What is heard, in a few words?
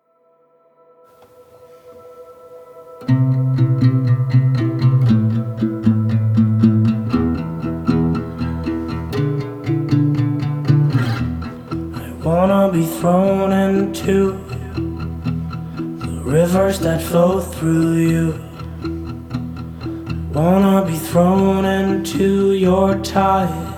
Acoustic Version